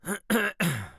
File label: cough